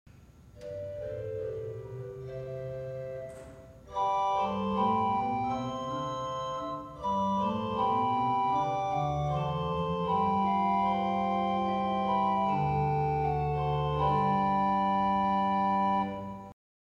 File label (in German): Principal 4', organ